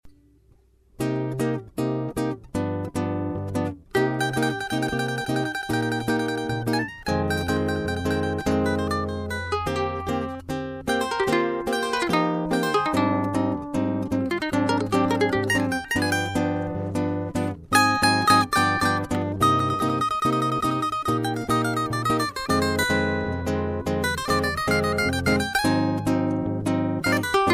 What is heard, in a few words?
instrumental